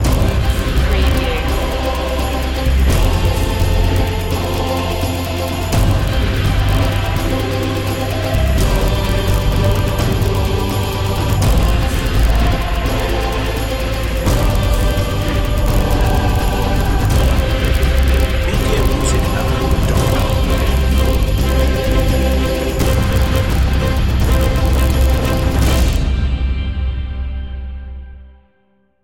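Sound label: rhythm section choir marcato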